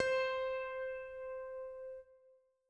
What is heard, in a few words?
Piano C4; 120bpm; Old Skool House; Big Fish Audio; Construction Kits; House of Amnesia